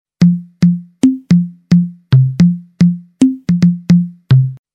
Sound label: Justbeat